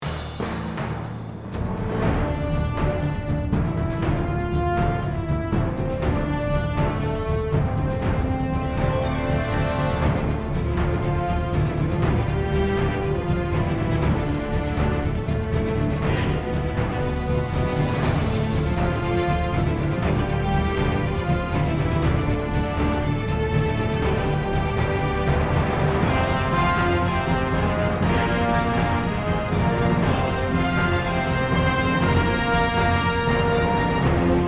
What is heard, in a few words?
battle indoor